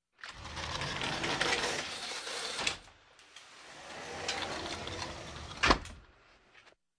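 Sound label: sliding door on rollers; Dver na rolikah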